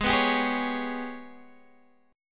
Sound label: Ab Minor Major 7th Double Flat 5th, chord; strummed; x,11,9,12,9,9; JGuitar